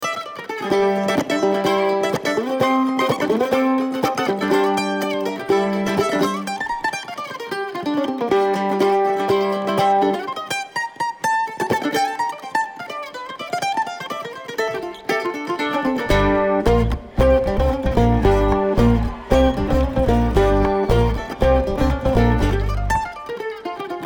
Kentucky Mandolin

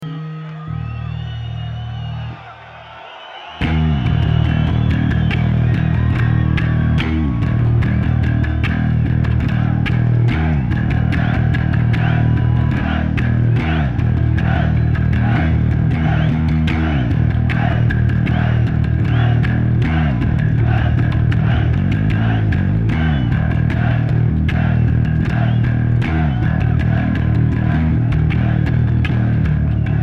Bass Solo

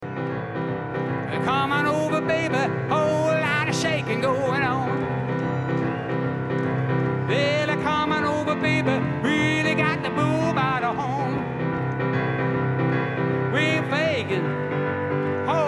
Piano Moment

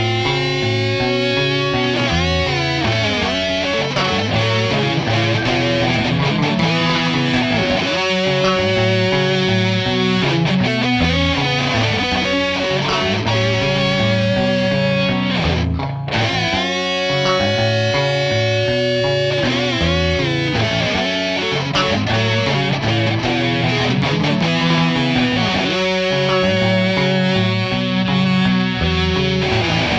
Instrumental Medley

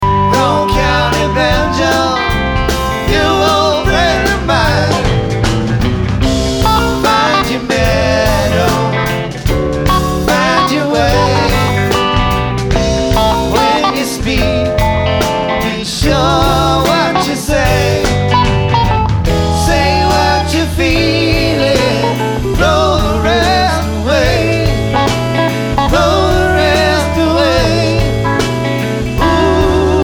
Banjo

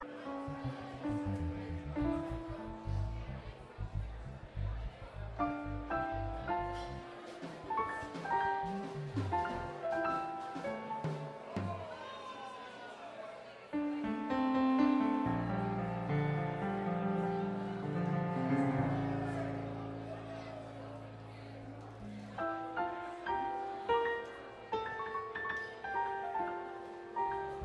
Tuning and Introduction

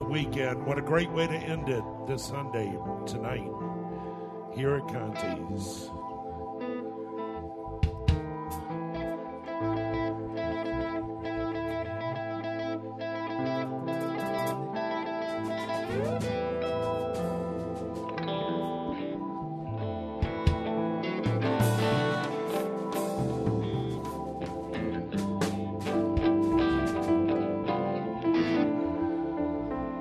tuning intro

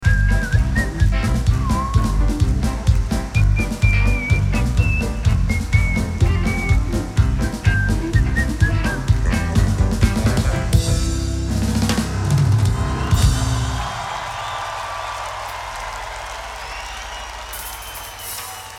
Whistles

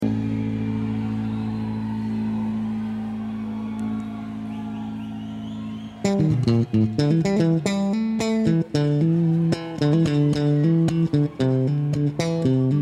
Bass Solo

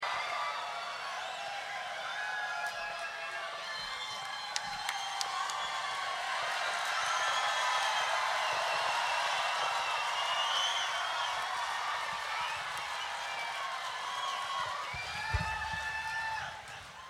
Crowd

